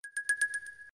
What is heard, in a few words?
load; WiiMart